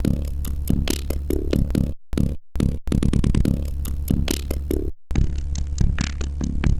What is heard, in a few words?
Beat, Drums, Eclectic Electronica